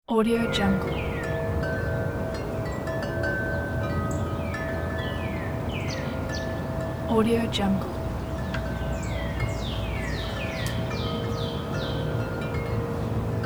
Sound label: windchimes